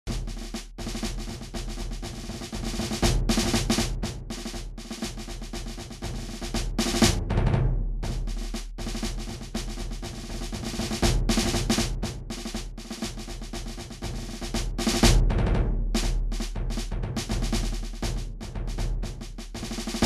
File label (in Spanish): los tambores grandes